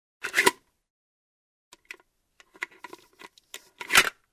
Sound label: Motorcycle helmet, visor up, down